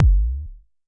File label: Jomox MBase, drum machine; Jomox MBase 01